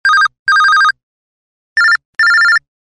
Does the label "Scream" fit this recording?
No